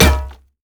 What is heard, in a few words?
basic attacks; Dr. Mundo; TPA Mundo